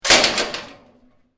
Metal Gate Or Metal Door Slam, Metal door shut with clanking; Metal sounds; Metal door shut with clanking and rattling; Gate Sound Effects; LYN097 package of Metal sounds